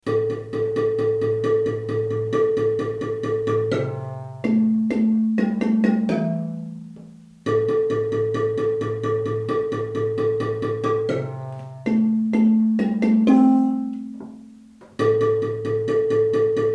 bari, marimba; gazelle

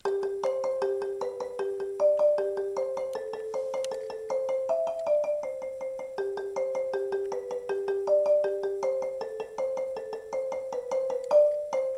pre outro, marimba

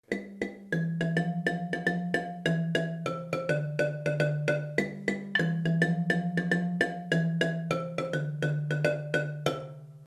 ending, marimba; low line

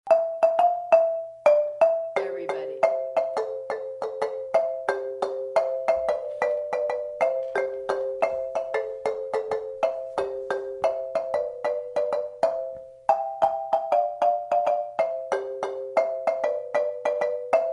lead chords, marimba

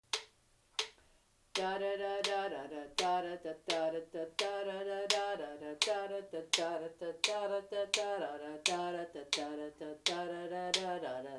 lead rolling rhythm, marimba; kare mugomba

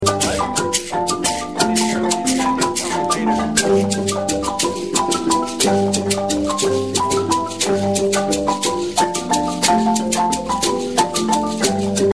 hosho variation, marimba; kare mugomba

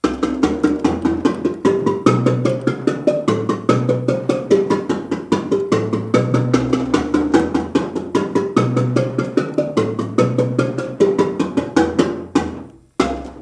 bass outro, marimba

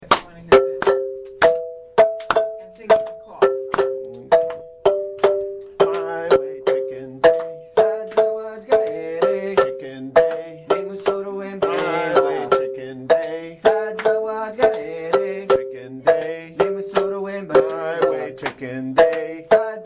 singing with s2, marimba; chikende

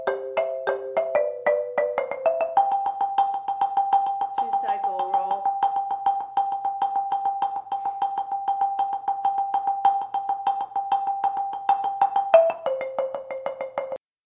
lead high chord signal, marimba

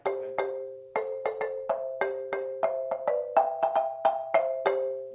lead interlude, marimba

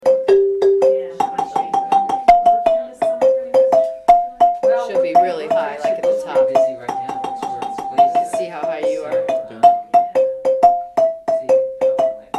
middle high, marimba

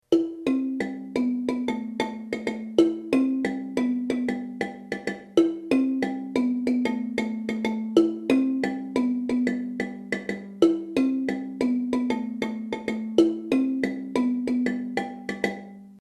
high line, marimba

